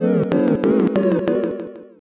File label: laugh crowd